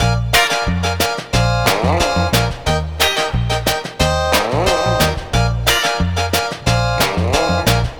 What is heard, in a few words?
Lounge Full Mix-A#; Zero-G Kocktail Kollection; Construction Kitz (Lounge); Welk Lounge 090